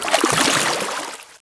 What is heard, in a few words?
WATER MOVEMENT